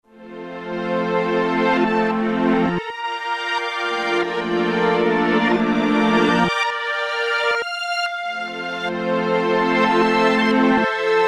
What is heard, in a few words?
Reverse Str, Roland SR-JV80-16 Orchestral II